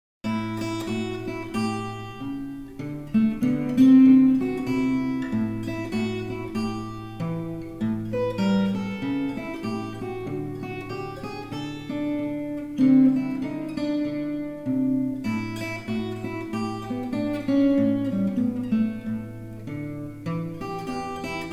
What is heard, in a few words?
blues en la